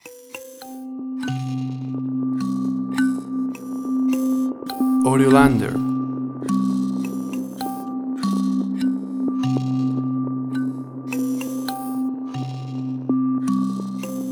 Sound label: Vacancy Deutsch 103bpm